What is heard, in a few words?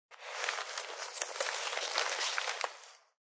Nws Ppr, Newspaper rustling while read; Crackling (Sound Effects:Objects)